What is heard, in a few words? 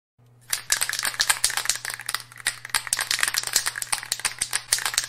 Graffiti Spray Shake